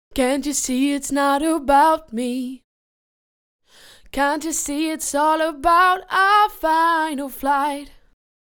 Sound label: 120 Cm, dance vocals; Sample Magic White Label Dance Vocals